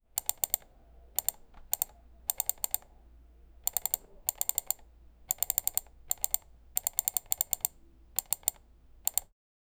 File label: computer